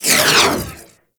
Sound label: Rifle Alt Projectile By, Weapons; Warframe; Tenno; Gyre